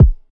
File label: good kick sample c key